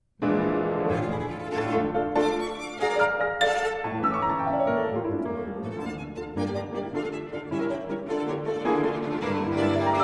piano quintet; foote

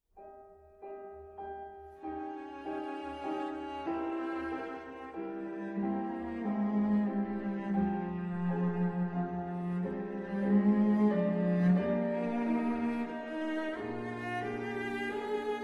piano quartet; oswald

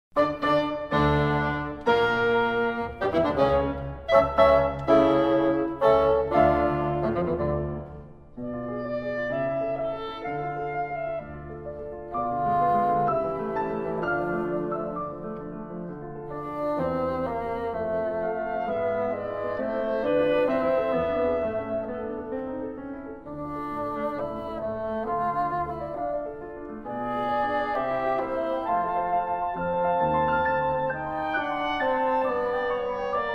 trio; glinka

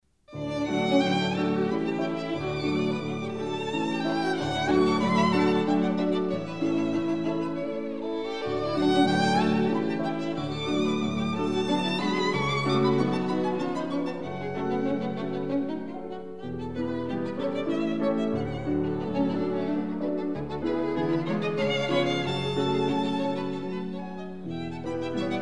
serenade; sinding

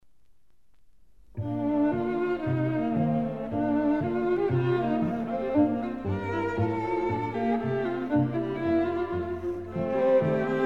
intermezzo; parry

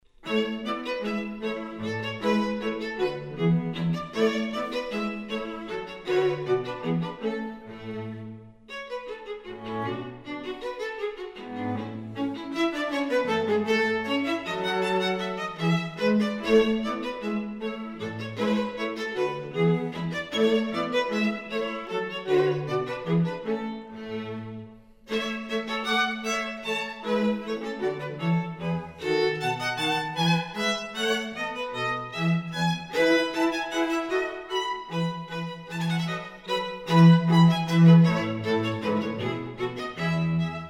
trio

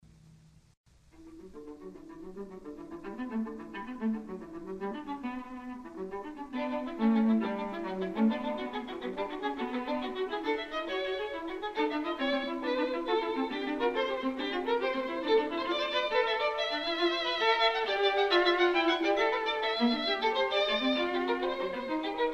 string trio; moeran

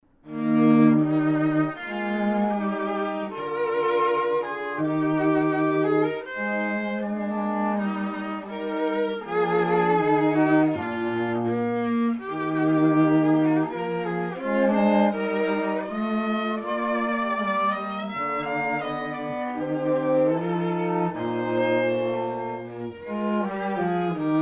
trio

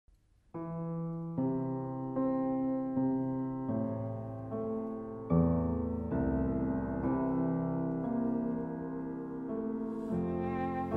cello son; reinecke

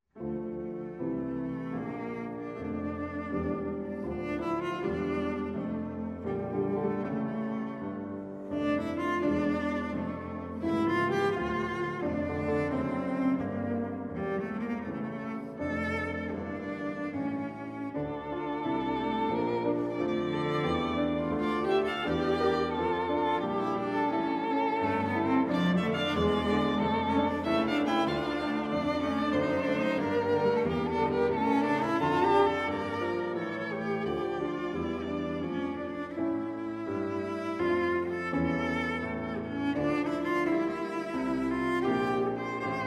piano trio; herzogenberg

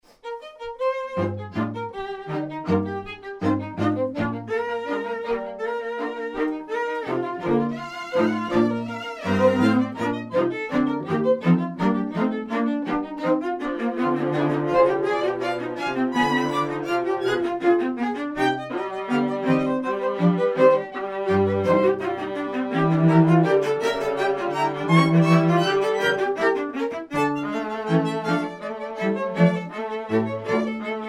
quintet; ries franz

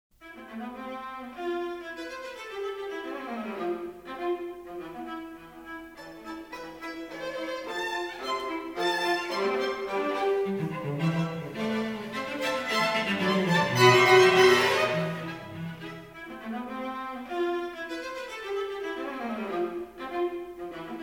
quartet

